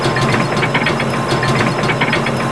wall lowering

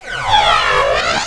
med scan

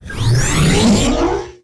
charging, ar3

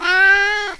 cat unfollow

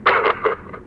chuckle, metrocop zombie; scmod

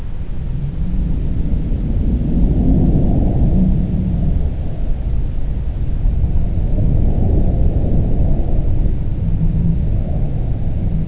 evil wind; ambience

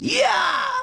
scream; frieza

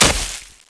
body knee; Physics

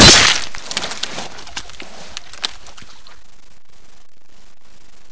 player decapitated

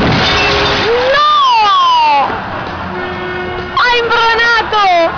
bodydrop

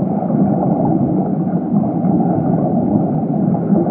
underwater loop; Liquid

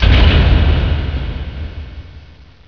impact

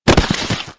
body drop normal; Physics

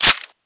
mp5 retract

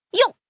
jump; ganyu

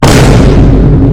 boom

